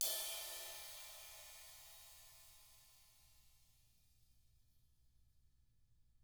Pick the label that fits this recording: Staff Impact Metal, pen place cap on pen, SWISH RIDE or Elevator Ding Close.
SWISH RIDE